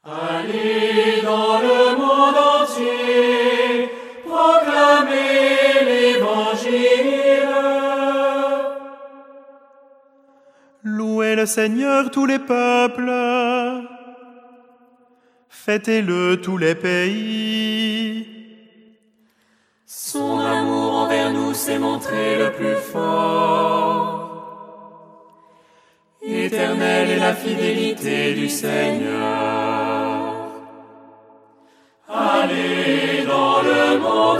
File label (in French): psaume ensemble vocal; hilarium; psaume 116